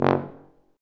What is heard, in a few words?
F TUBA, stacc; Roland L-CDX-03 Disk 2; BRS Tuba